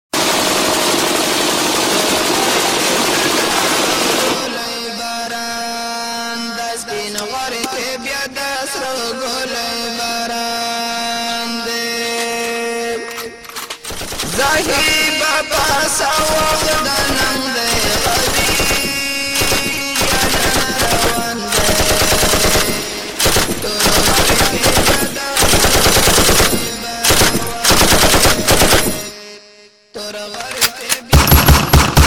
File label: Afghan Ringtone